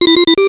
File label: Ring, Cisco Unified IP Phone 7945G